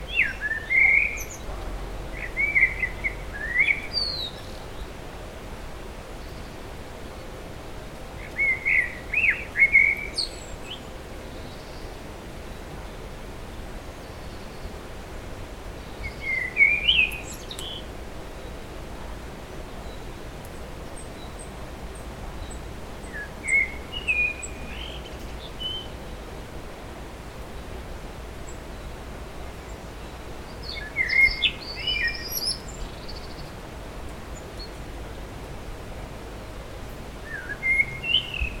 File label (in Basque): zozoa, Mirlo común, Turdus merula, Canto; Observatorio de aves; Donemiliagako Udala